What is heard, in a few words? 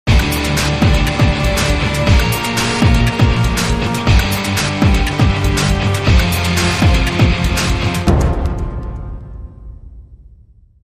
Bomb Alert